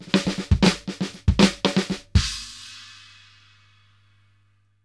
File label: Fills 120; Zero-G; Monster Beats